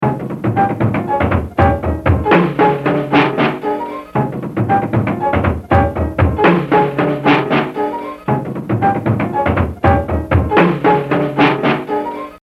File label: Exercise 5, Jazz Drumming - 100%; Baby Dodds; The Evolution of Jazz Drumming (Gottlieb)